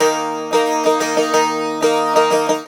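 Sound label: D#, bouzouki; USB Soundscan vol.53; Mediteranean Traditions; AKAI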